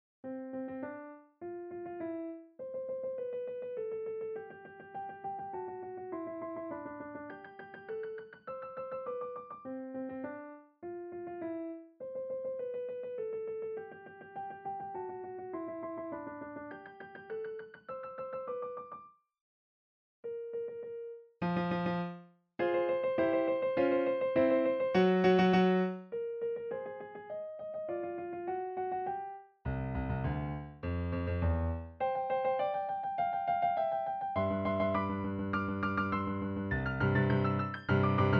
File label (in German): Klavierstuck; Bagatelle Do Majeur; Ludwig van Beethoven